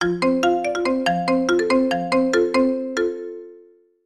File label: marimba